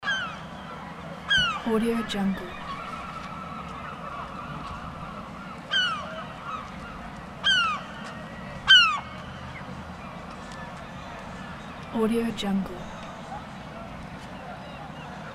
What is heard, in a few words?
harbour ambience